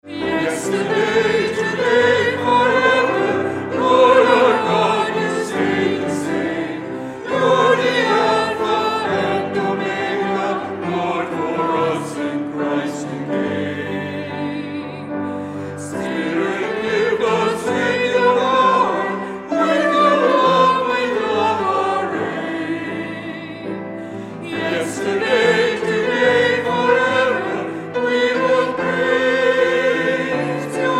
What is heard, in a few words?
music